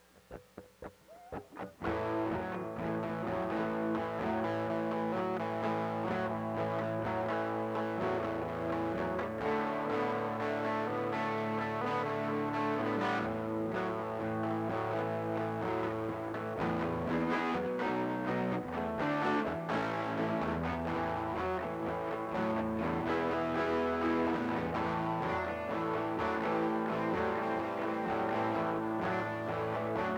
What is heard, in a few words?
Riff - A D eb da e